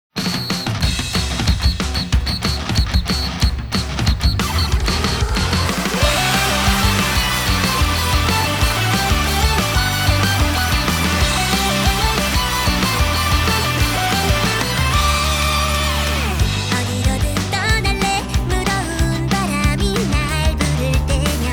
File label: Vocal Version; Destiny Child